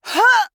Mage Grunt Physical; Realm Royale

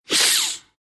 pain ru

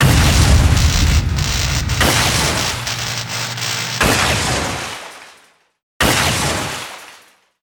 Thunder Strike

